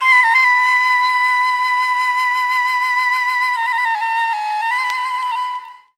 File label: flute